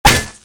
hit world

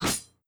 batarang hit

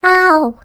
player hurt, vocalization; carbon miku; captainbigbutt; kuroyubots

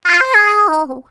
player hurt, vocalization; carbon miku; captainbigbutt; kuroyubots

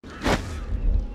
double jump

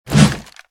miss

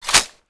ak clipin; ak beast; csocf weapons pack

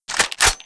reload awm; cf l96; csocf weapons pack

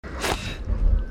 double jump

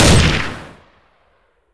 m4 unsil; m4 beast; csocf weapons pack

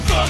empty, weapons

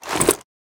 m4 silencer on; m4 beast; csocf weapons pack

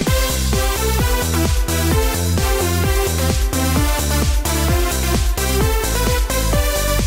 soviet techno; taunts; kirazeito pack

